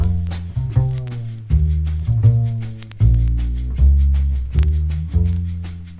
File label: bass